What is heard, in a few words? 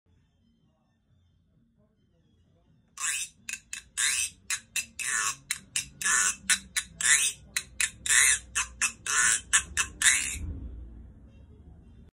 Güiro; گوئیرو